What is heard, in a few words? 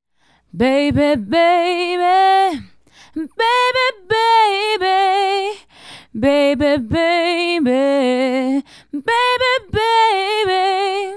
Female Solo, Hip-Hop and RnB Vocals